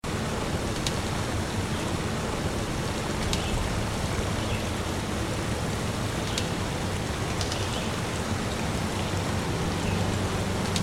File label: Nature